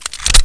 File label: 9mm clip; svencoop; echoes